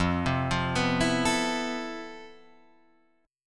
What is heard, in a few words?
F Major Sharp 11th, Guitar, Drop D